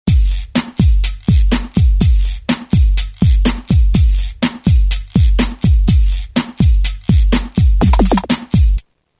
Cruisin' 124; Roland M-DC1

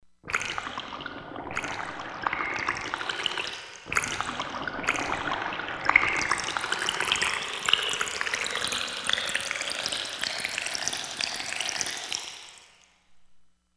Pour Water; Korg MSC-16S Environment

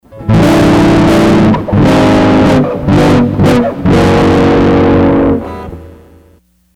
spin tone; Sony HR-GP5

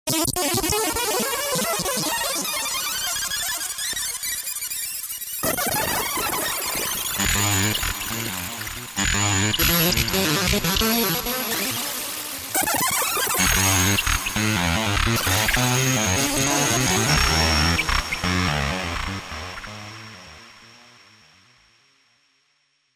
INSECTRON, Twisted Special FX; Universal Sound Bank; Soundscan 14 Twisted Special FX

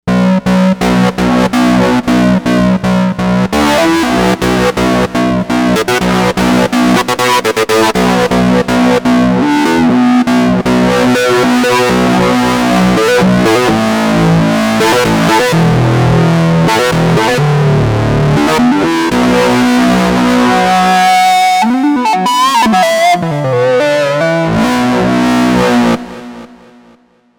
Bass Rocka; Novation UltraNova; Factory Patches

